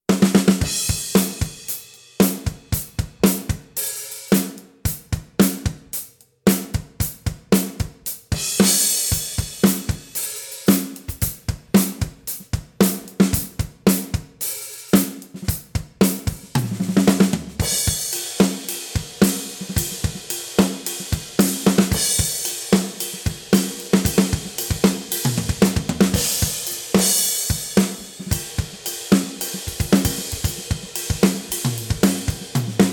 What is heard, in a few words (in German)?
LB552 mittel mit Ring